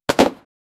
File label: soft cute cartoony sound